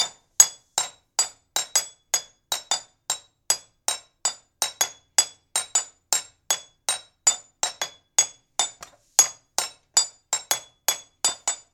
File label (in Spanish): vidriofono agudo